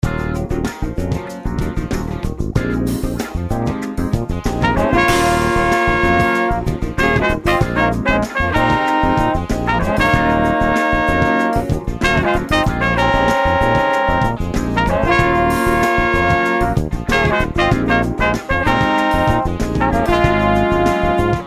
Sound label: Smooth Jazz